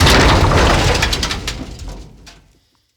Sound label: Ship Hull Impact Large; Vehicles; Hit Effects; RailJack